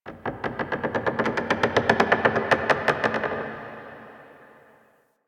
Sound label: Wood Creak; Warframe; Quests; The New War; Ambience; Zariman